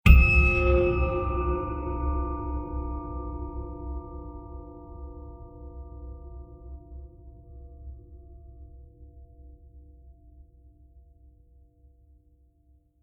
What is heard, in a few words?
Sci-Fi Impact With Scary Laser; Science Fiction UFO Flyby Transition With Panning Delays